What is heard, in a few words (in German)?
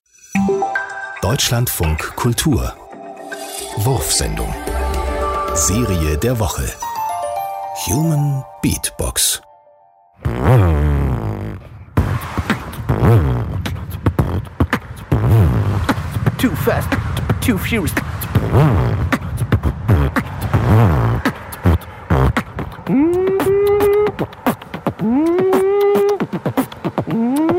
human beat box strasse too fast; wurfsendung